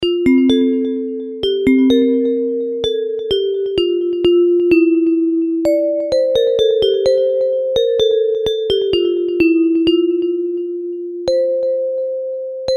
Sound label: Bells